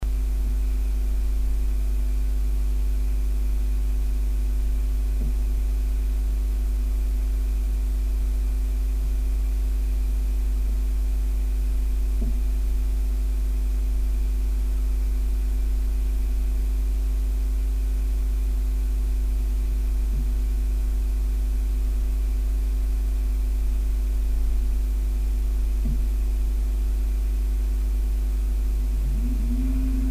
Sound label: op37 w mic